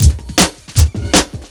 LOOP FX, Big Beat Drumloops

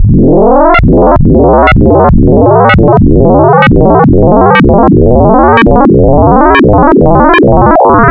FM 2 Sine Sine, wavetables, Frequency Modulation; Architecture 32

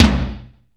heavy t, Yamaha RX-5

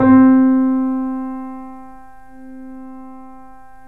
3D Boesendorfer; E-MU Producer Series Vol. 5; 3-D Audio Collection

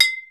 BOTTLE; East West; Global Sounds; HR16

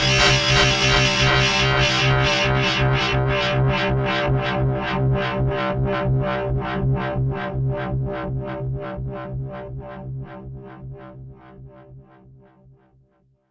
SEA, Trip Hop

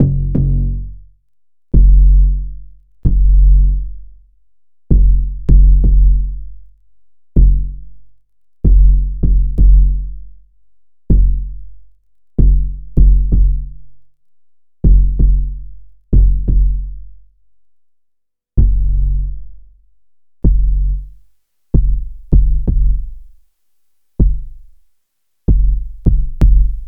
Bass High Quantity

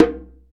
PER Percussion

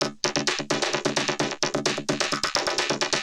Vocobeat; Sonic Foundry (Sony Creative Software)

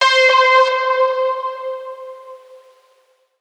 synth note stabby C; Virtual Riot; Synth Notes & Chords

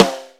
high rim; Jazz

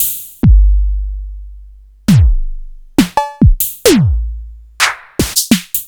Yamaha TX16W

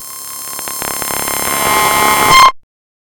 SONAR, Hard & Loud Techno; USB Soundscan vol.01; AKAI

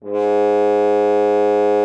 FR HORN G#2; Xsample VOL-3

